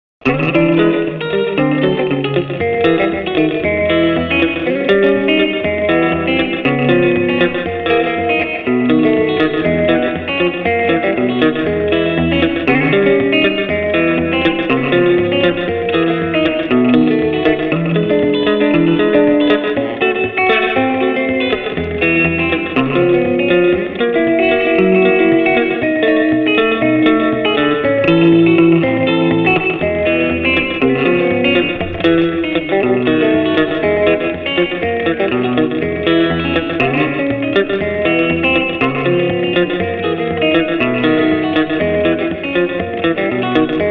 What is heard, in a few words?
GUITAR DELUX RAG, rockabilly; Rock’n’Roll